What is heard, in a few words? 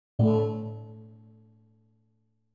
A3 drums